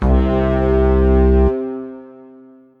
bgm title end; Nickelodeon Basketball Stars 3; ThrillGames

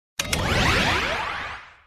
level complete; Ben 10 - Alien Catcher

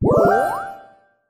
hint circle; Nickelodeon - Back to School Search

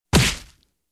hit; Sling Birds

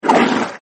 attack; mondo

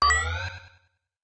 reload; Adventure Time - Angry Betty; ThrillGames

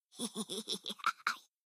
hehehe; Ben 10 - Omnicode

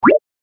reset; Food Farmer

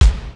kick; Hanuman Ultimate

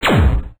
fire; Fighter Aircraft

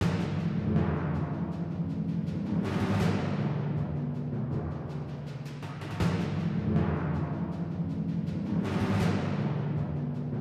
grunge tone; Hanuman Ultimate

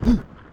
land, basketball; Nickelodeon Basketball Stars 3; ThrillGames

